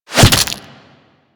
Desert Skate Barb Hit Default C; Warframe; Attacks; Hit Effects

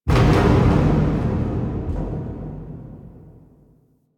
Metal Rumbles; Warframe; Quests; TheNewWar; Ambience; Zariman

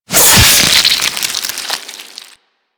Glaive Stealth Hit; Warframe; Finishers; Stealth Hits

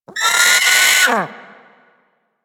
Sea Bird Vocal Alerted; Warframe; Hunting Critters; Ostron Sea Bird

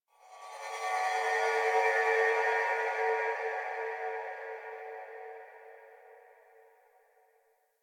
Texture One K, Ambience; Warframe; RailJack

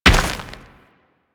Step Dirt, Footsteps; Warframe

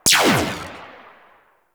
Spear Gun Tail, Weapons; Warframe; Corpus

